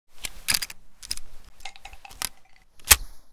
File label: winchester reload, Double Barrel Shotgun; zps; Weapons; Firearms